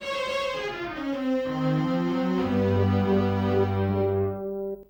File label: jingle ~ lakitu's message